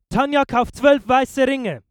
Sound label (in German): Schreistimme Mann